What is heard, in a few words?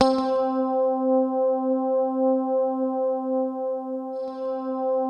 C4, SynthLine; 90 s Sample CDs; NiceBeats; SynthLine VOL-33